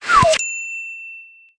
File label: Shrink